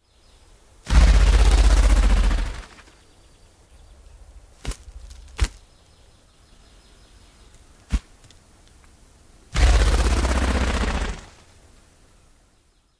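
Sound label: flutter flights and wing thumps, Sprague's Grouse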